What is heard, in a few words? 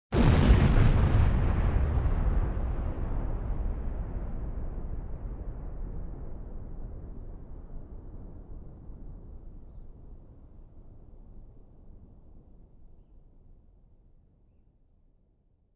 Bomb Blast